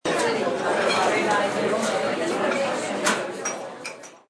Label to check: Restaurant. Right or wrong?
Right